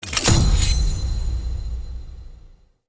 UI Standing Gain; Reward Popup

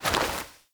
Generic Air Roll Whoosh; Warframe; Characters

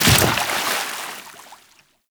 Land Water, Footsteps; Warframe; Tenno

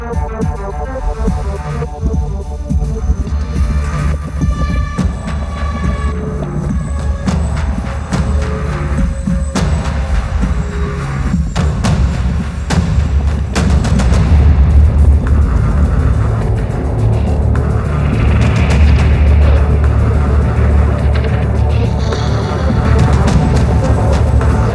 Purgatory Music Cue; Deadlock Protocol

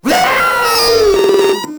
Proto Infested Runner Player Spotted; Warframe

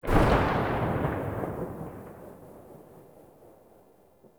Concrete Archgun Charged Tail; Warframe; Archwing

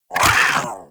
Combat Vocal Attack Short; Warframe; Catbrow

